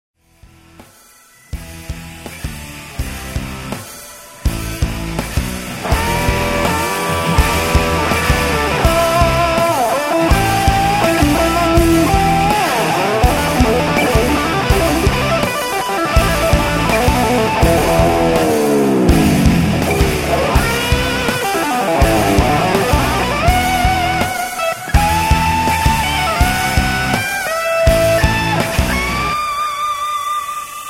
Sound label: ACID HEAVY ROCK SOLO